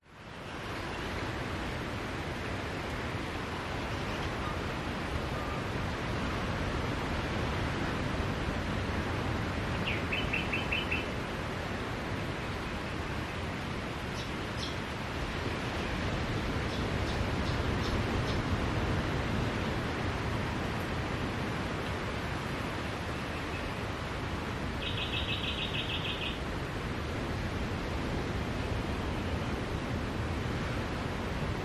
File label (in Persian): sedaye darya